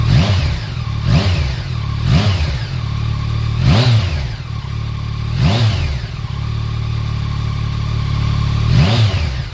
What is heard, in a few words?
Akrapovic; Tracer